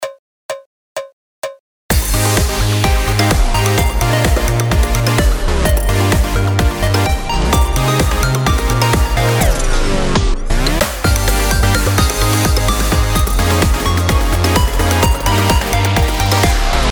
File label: pitch Shift Tape Stop